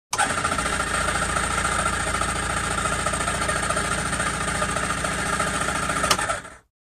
False Electric Start; Shuddering And Grinding Of False Start. Close Perspective. Prop Plane.; Broussard M.H. 1522